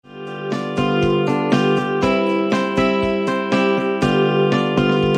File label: Old Dusty Guitar